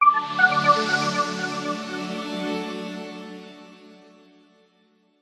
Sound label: multimedia musical logo branding signature medical science tech arp into warm pad